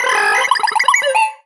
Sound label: Sci-Fi Lasers - Droid Talk; Antidote Advent